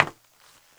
METAL, FOOTSTEPS; AKAI S-Series CD-ROM Sound Library VOL-6

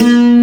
SANTUR C4; Greytsounds; Scott Peers Sound Engineering

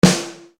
EFF. SD, Yamaha RY-30

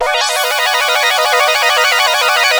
HTRILL, Korg, FX; Back In Time Records; Korg Universe VOL-1; FX FAMILY

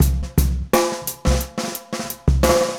Drag Queen, Drum Loops, Jazzy; Zero-G Smoke

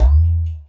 EQ Perc, Percussion Mix

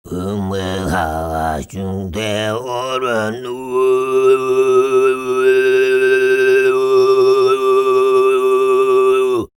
SOLO, TUVAN RASPY, World Voices; Spectrasonics Vocal Planet CD5

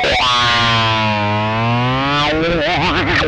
whammy fx, guitar; 90 s Sample CDs; Zero G - Funk Guitar

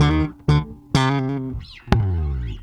Bass Loop, Ambient Grooves

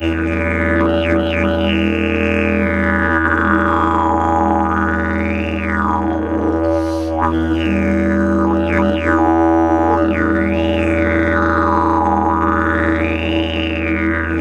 E LONG DRONE, Didgeridoo; Big Fish Audio - Didgeridoo and Other Primitive Instruments

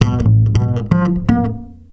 Upright F, Basses; Total Drum&Bass

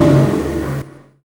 CYBER KIT, DRUMS

